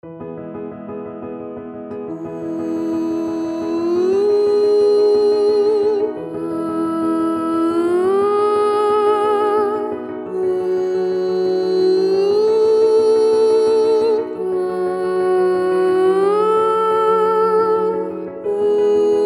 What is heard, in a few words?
female voice